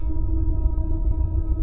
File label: engine